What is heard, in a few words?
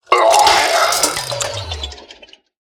Shambling Zombietank Death